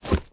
SFX Interf Coger Objeto

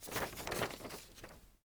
MOV PAPELES

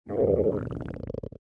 Tripas

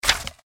Cuaderno abrir

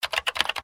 Teclas

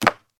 CAER TACONES MADERA; yesterday origins gamerip 2016 switch ps4 pc ios macos

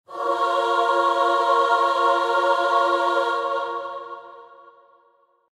Acorde; cartel

